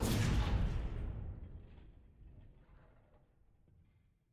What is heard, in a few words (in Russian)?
gluhoj korotkij zvuk vistrela s otdachej